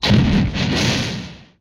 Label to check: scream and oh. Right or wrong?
Wrong